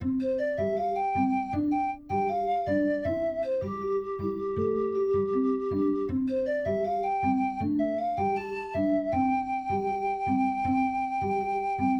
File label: short comedy; starlight vega linux macos windows gamerip 2015